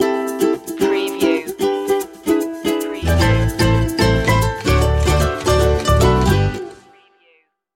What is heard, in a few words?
ukulele joy stinger